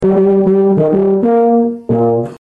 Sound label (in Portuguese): tuba